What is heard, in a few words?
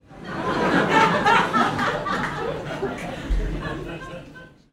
human audience laughter comedy club x200 people